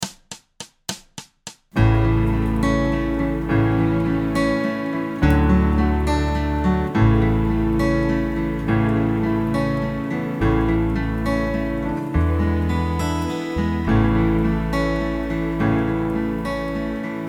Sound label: Minus Guitar